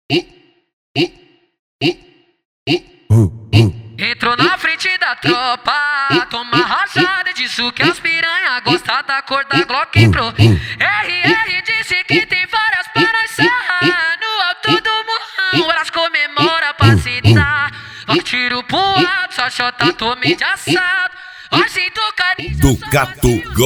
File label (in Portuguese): especial funk